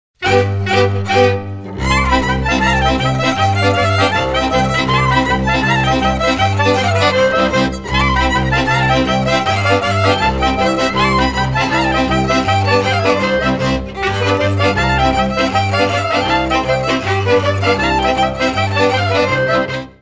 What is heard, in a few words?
polka